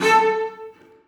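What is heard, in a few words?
celli staccato a4; Sonatina Symphonic Orchestra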